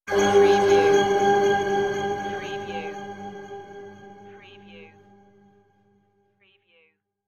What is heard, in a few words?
energy shield activation